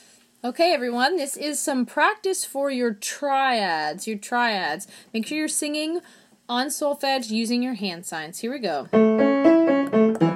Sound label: Triads